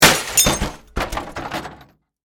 Impact Computer, Impact, Computer, Monitor, Smash, Rock, Back, And, Forth; Miscellaneous Impact; Blast Wave